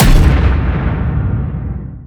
hoof stomp; The Monster Plane; kolmods melee

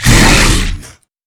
pyro attack; The Monster Plane; kolmods preatt

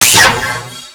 Laser Main; Laserman TITAN

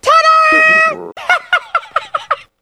hunter attack mix; catnap n grabpack; elmo

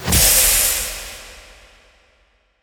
acid powerfull sound; zoochosis; whale

